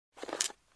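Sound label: dice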